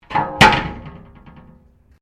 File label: Door Pivot Interior Glass Cabinet Misaligned Close; Various doors; Miscellaneous Doors